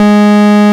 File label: Bass G#2; Ableton Foundation FreePacks; Foundation Glitch Toys & LoFi